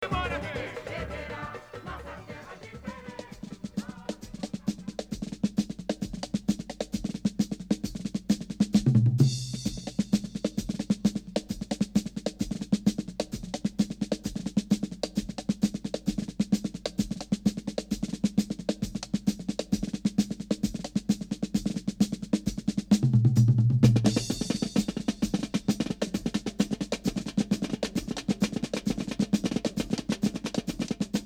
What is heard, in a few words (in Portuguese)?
Batucada